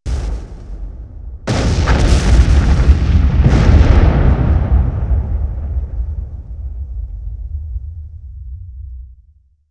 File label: Explosion, 5.1 Surround